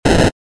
ゴゴッ 動かす レトロ 短

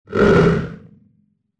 モンスターの鳴き声

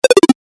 キャンセル 低音 レトロ

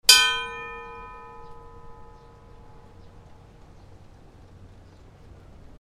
西洋の鐘の音

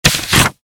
ページめくり モノラル

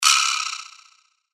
カラカラ ギロの音

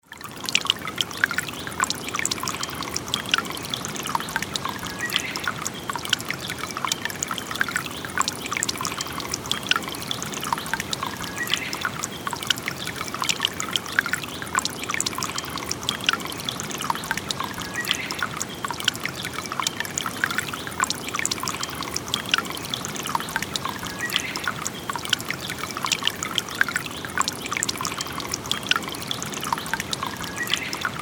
森の川の音; 環境音

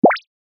ぴょこ; 動畫和制作視頻的音效

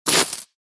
購入や売却

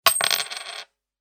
コインが落ちる音; 場面切り替えや心理描写; 演出効果音

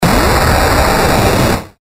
機械の動く音 長め モノラル

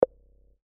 ポン コミカル

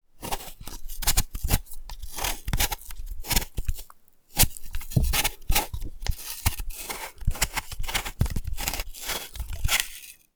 皮をむく音

